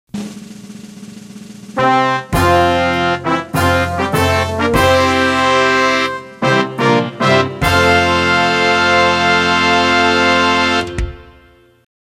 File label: fan